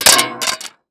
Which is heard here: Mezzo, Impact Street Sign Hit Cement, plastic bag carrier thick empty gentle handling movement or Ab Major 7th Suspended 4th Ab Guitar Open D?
Impact Street Sign Hit Cement